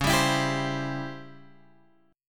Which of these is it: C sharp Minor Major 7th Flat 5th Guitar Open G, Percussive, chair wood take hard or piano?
C sharp Minor Major 7th Flat 5th Guitar Open G